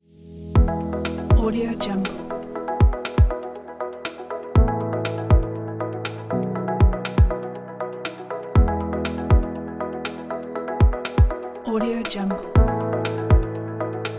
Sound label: ambient technology